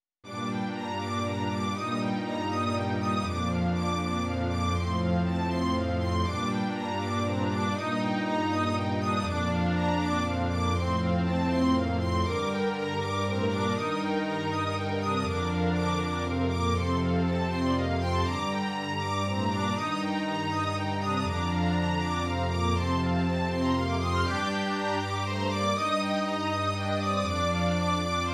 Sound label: string choir; about 30 seconds; String Choir #1; I think of it as being the background for a car commercial, maybe.